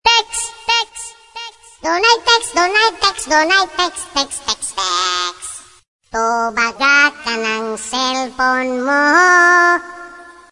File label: Funny SMS Ringtone